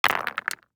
DICE on Neoprene, Throw and Roll, Mini, 6 Six Dice; Samples; ArticulatedSounds; DICE Sfx Party (Extended)